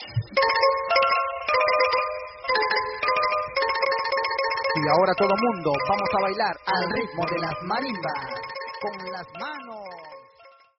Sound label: marimba